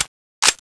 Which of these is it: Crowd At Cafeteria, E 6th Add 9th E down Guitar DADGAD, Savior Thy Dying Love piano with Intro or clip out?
clip out